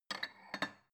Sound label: bowl take soft